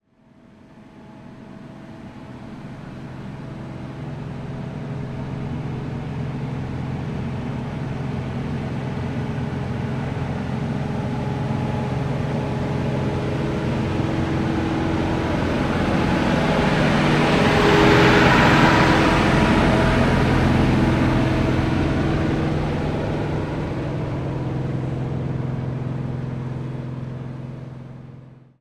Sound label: claas dominator combine exterior pass by fast constant speed; mono